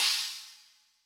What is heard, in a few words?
China Hi, Cymbal; Yamaha DTXpress-II